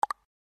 Notifications

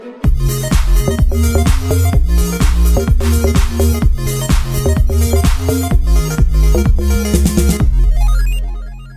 Techno Jam

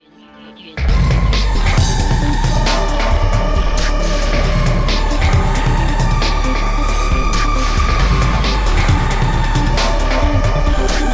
Calm

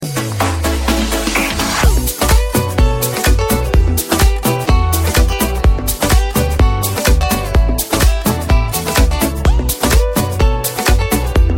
Best Guitar

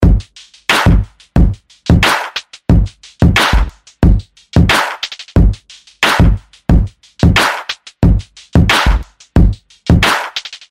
Basshop

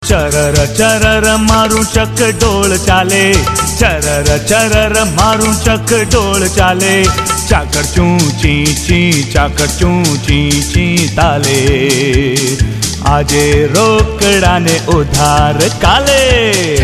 Garba

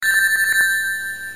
losing rings; Sonic

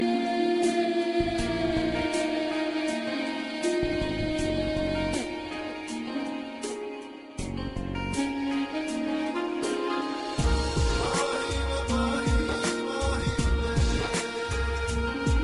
Bg Tune

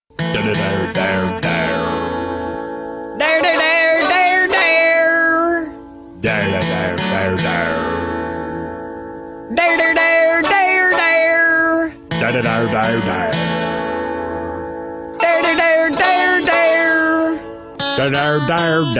Very Funny Tone

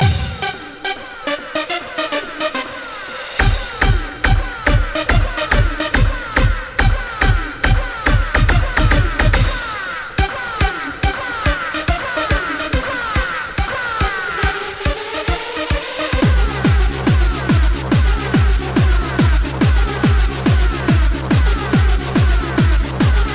Tecno tectonik

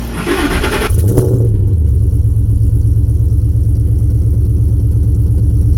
F150 Raptor startup

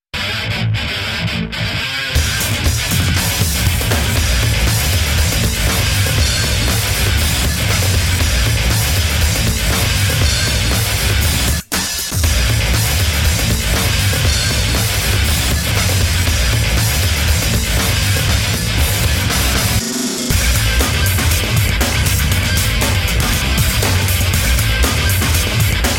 Hard Guitar

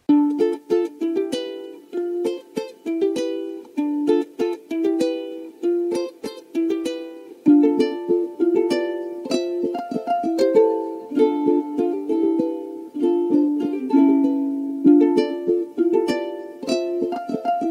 Uke

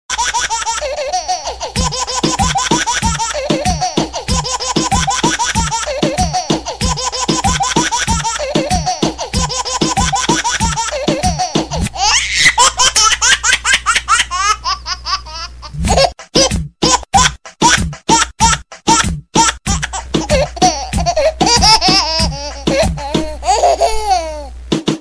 Baby laugh

